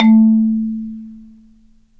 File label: Marimba; Roland LCDP11 Africa VOL-1; Af.Marimbas